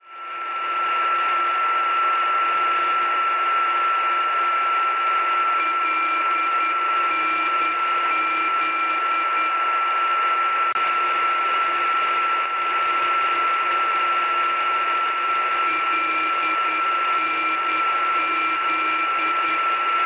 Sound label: NDB Linz Austria 327 kHz; LNZ; Schwarzsee